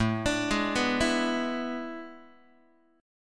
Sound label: A Major 7th Suspended 2nd Suspended 4th, Guitar; AM7sus2sus4 arpeggiated; JGuitar